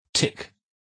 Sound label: tick